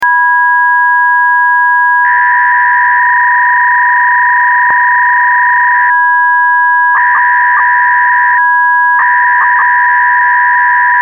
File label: modem